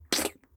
Splat

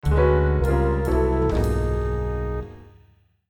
Failure; Cook Serve Delicious

